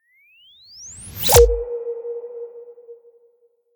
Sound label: Laser Scope; Plastic Surgery